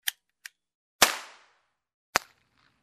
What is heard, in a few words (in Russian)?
zvuk igrushechnogo pistoleta